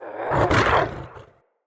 Wolf Attack Growl Spawn; Orengall; Animals

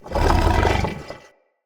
Corrupted Battle Dog Stun End; Animals; Vocals

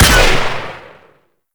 Sentient Pistol Shot Primary; Warframe; Amalg

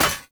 Short Sword Medium Impact Armour

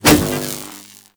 Prod Whoosh; Warframe; The New War; Drifter

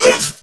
Pain; Warframe; Corpus Crewmen; Crewman C; Dialog Barks Battle

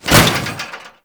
Restrained Foley, Worker

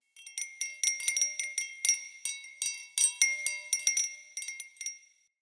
Tiny Bells F; Fey Hub

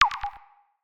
Objective Completed Beeps

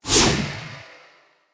Sphere Polearm Throw Perfect, Weapons; Player

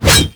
Ghoul Devourer Melee Swipe

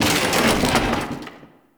Chair Destroy Misc; Warframe; Entrati; Ambience

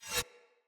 Saw Dagger Whoosh Accent; Weapons; Player; Swords

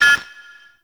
Interior Orbital Station Console Count; Warframe; Tau

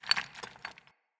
Smithy Rock Crumble; Ambience; Soul Plane

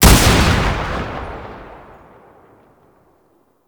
Exalted Sniper Shot; Warframe; Frumentarius

